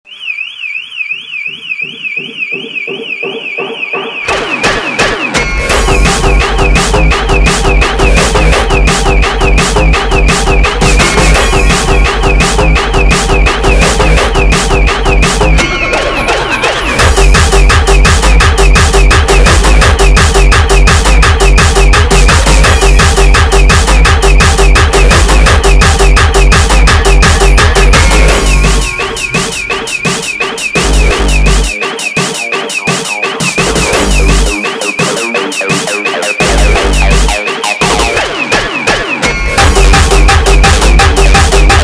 Hardtekk sped Up; funk

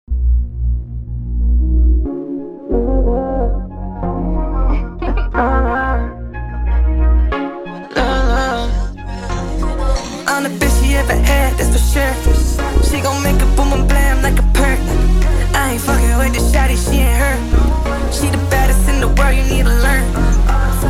brega Funk Remix sped Up

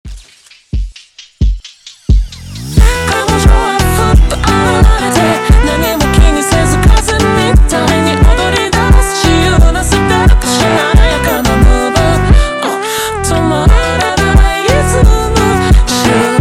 slowed; آهنگ فانک

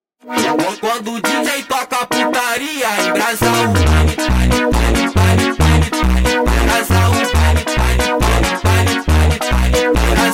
Montagem sped Up

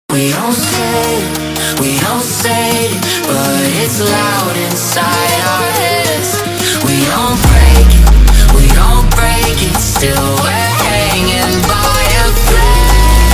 Funk - Sped Up

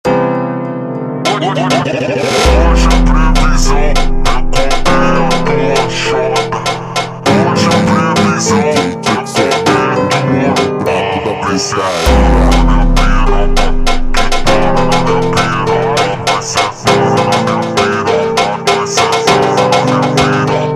Melancholy Funk ultra Slowed Version

